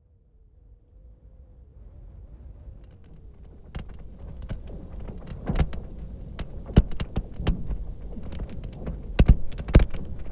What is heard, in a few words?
Frozen Air